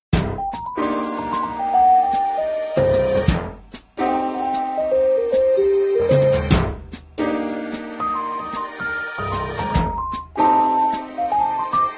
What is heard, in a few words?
Music Menu; Monster Dynamite